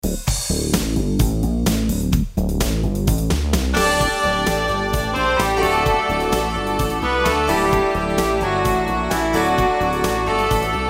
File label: industrial groove